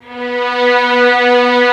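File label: Vio legato B2; Digital Sound Factory Virtuoso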